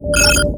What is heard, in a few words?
ambient bridge, computer